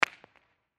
Hockey Puck Drop, Hockey Puck Drop On Ice Face Off; SPORTS; Blastwave